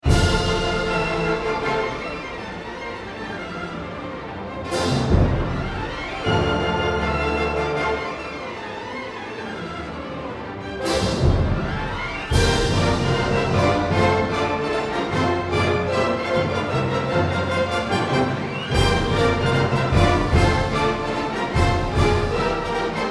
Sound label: orch samples mix